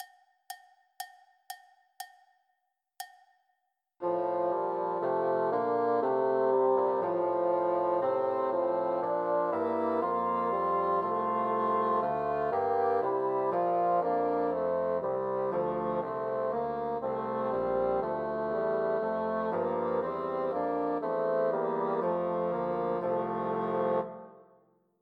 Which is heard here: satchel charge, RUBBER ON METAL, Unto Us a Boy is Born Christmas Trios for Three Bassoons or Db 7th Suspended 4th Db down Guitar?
Unto Us a Boy is Born Christmas Trios for Three Bassoons